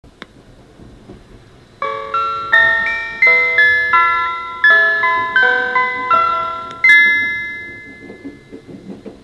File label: chime